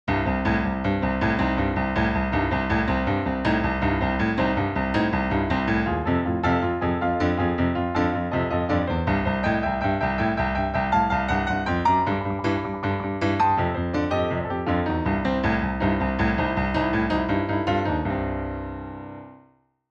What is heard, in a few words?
Piano sample